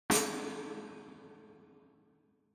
Tambourine Reverb

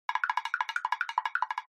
Motion Tremble Short

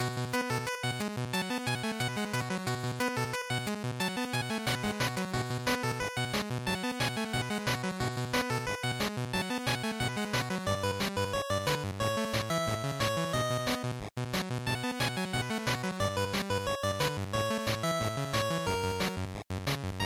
Racing Stage Loop, bgm

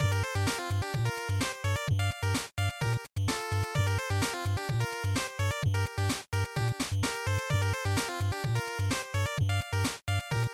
Result Loop; bgm; GB Sports

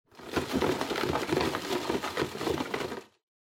Drawing Box, Rustle

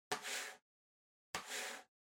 PC Mouse Move Far Hard Pad Hit

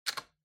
Wall Outlet Dry Plug In

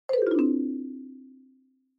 Xylophone, Fast Short Down

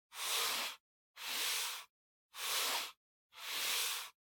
PC Mouse Move Soft Pad Long

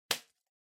Public Phone Receiver Catch

